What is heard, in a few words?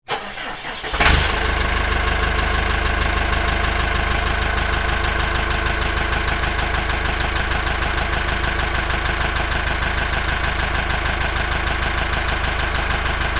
Tow Tractor Run, Industry, Tow, Tractor, Start, Idle, Off, Diesel, Engine, Pettibone; Hangar, Interior